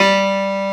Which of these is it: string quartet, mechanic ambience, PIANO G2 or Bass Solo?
PIANO G2